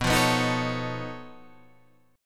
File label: B 9th Flat 5th B down Guitar Standard; B9b5 strummed; JGuitar